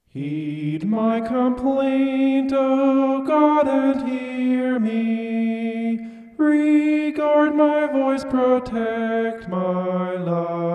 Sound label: vocal homoph; psalm 64